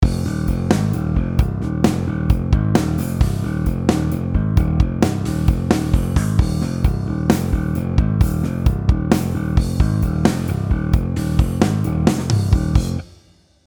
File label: polymeter